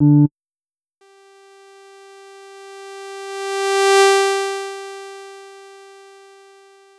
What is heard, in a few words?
transverse bounce illusion